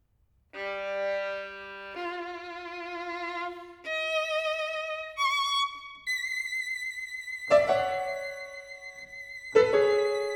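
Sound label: suite for violin and piano introduction andante maestoso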